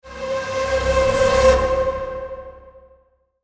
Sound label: Sweeper Electric Feedback, Electrical Digital Buzz Warble Delay; Production Element Imaging Element Accent Transition; Electrical Sound Effects